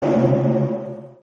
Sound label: bane missile sound; Arc of Templar